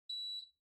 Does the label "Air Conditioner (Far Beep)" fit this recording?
Yes